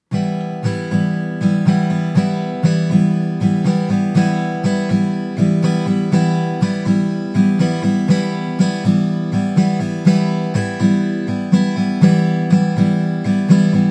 bicie 4/4 na akordzie e-moll

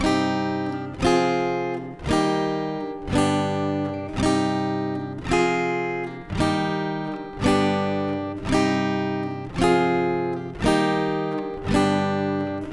ćwiczenie c moll, gitara

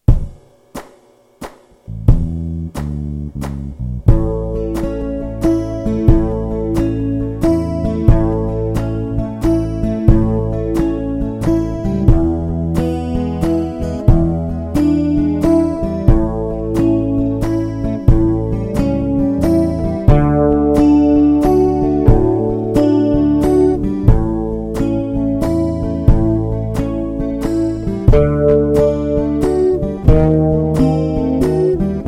cicha noc podklad A-dur szersza harmonia

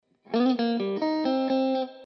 zagrywka blues wejscie na bridge zagrywka C C, gitara

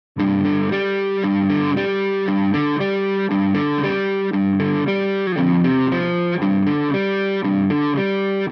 riff zwrotka

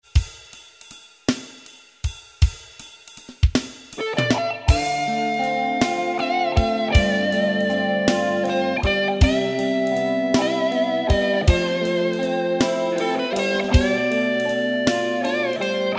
melodia, gitara

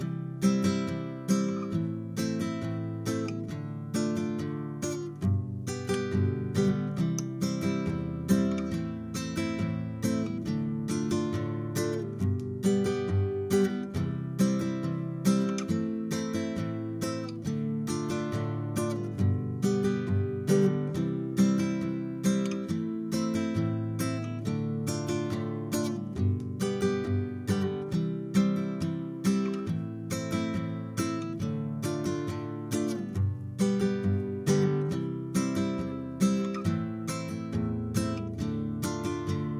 bicie nr7 tempo140; 7 najpopularniejszych bic gitarowych; CadG

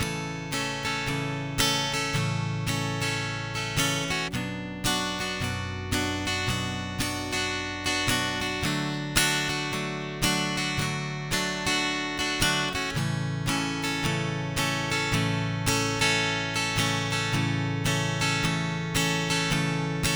bicie swingujaco, gitara; AhDA

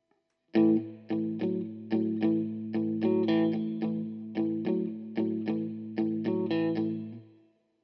rytm blues wolno A, gitara

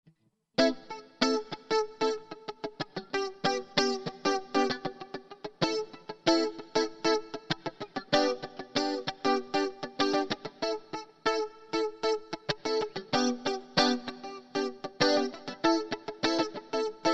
rytm samby tlumienie, gitara

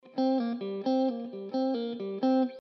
zagrywka na akordzie C

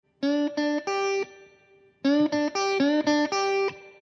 zagrywka g-moll, gitara

